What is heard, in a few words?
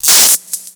jumpercable sparks; favela; Call of Duty Modern Warfare